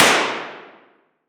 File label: Weapon Gun Pistol 9mm Glock Single Shot Interior Shooting Range Short; AdobeCC